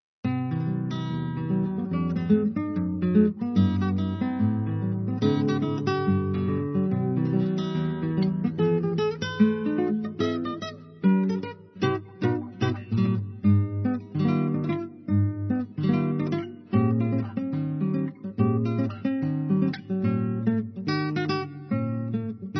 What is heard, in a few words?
jazz suite